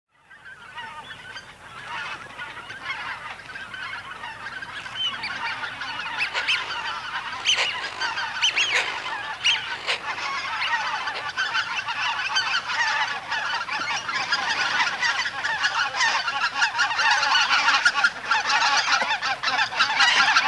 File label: White-fronted Goose